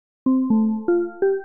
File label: HOUSE EXTRA, 125